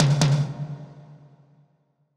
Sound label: Tom, 140bpm; Zero-G Sound Sense Grime Scene; GS Construction Kits; Just Flex